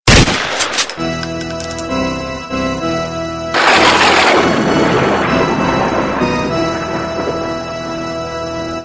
horror sound; ringtones; sms

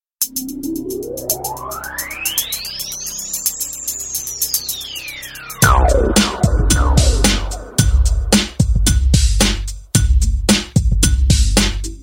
GOOD BASS

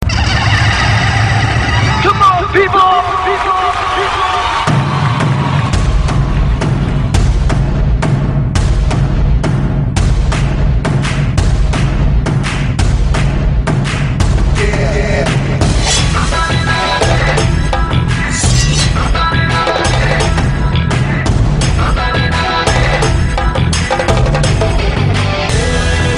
kerala style, dance

